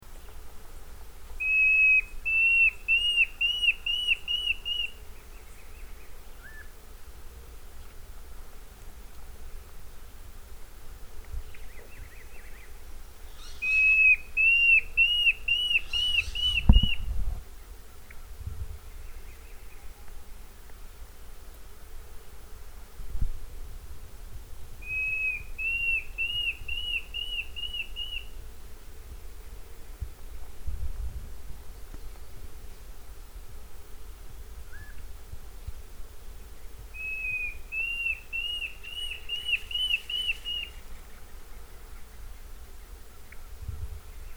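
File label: Mackenziaena, bird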